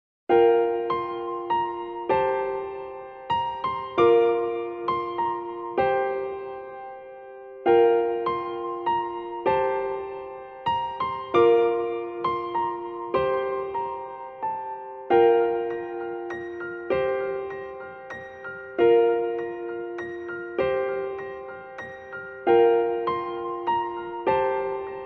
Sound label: piano; maoudamashii